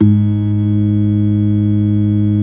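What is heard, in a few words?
ORG.3 G sharp 2; Best Service; Advanced Media Trax Modern Composer; B3 FULL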